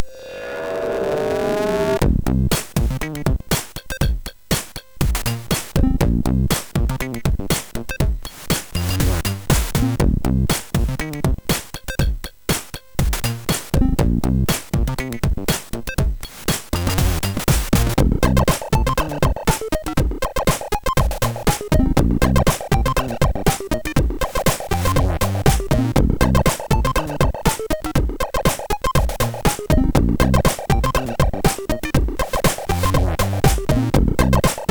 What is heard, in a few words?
Happy Hip Hop, MOS 6581; hvsc